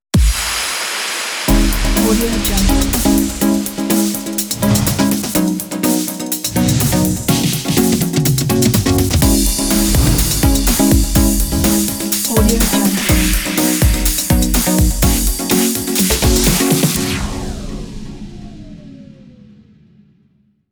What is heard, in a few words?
dance logo